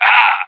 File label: whack popup; source engine; left 4 dead 2; moustachio